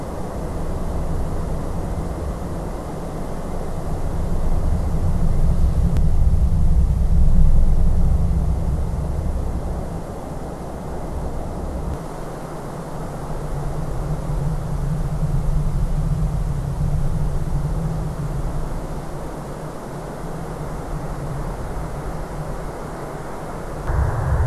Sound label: WFR audio emfisis